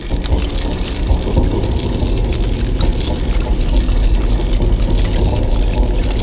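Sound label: ambience; svencoop; commandandconquer; tiberium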